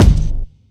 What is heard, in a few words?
reverb bass drum sample g key; Duration 0:01